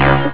Collision; Robot Warriors 1.0.1

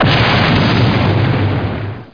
Lightning Power; HereticII-Demo; Weapons

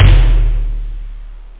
tom; toms

